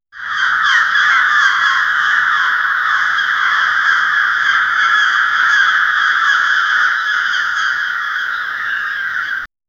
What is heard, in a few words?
crows cawing far away